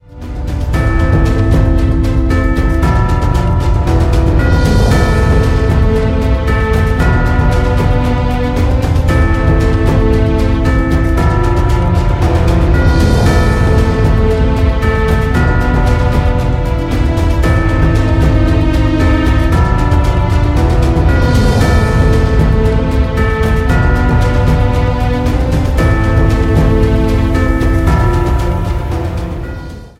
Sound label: Nerve-racking Suspense; MIUI14 and HyperOS 1.0